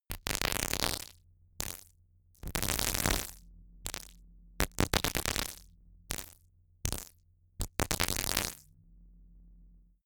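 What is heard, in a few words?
simple crackle sequence, science fiction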